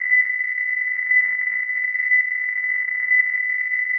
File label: wide filter